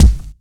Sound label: airy kick single shot g sharp key, kickdrum tuned to the G# note